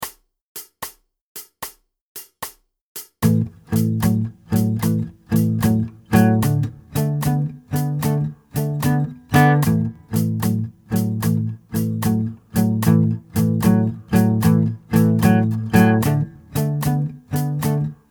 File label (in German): Dynamisch begleiten